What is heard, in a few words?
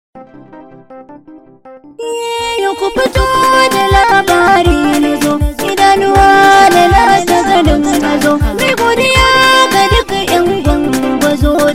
Jingle